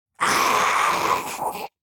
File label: Demon beast imp monster groan death